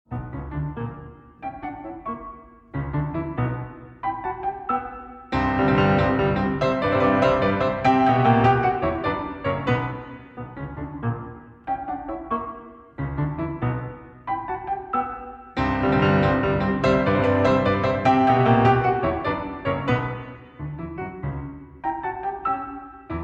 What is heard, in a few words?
Piano Sonata in F-Sharp Minor, Scherzo. Allegro